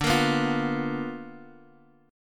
B Minor Major 7th Flat 5th, chord

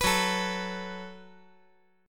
Gb Minor Double Flat 5th

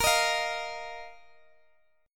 A sharp Suspended 4th Guitar DADGAD

